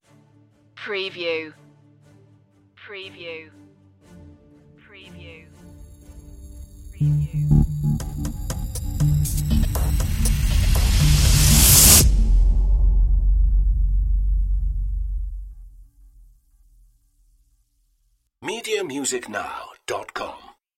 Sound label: interference stinger